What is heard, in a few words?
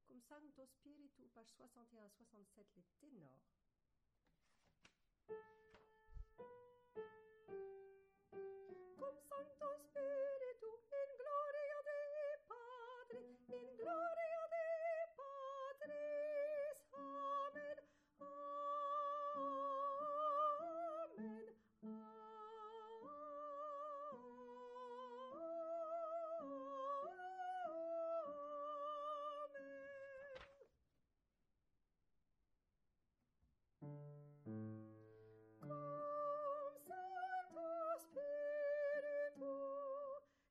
Tenor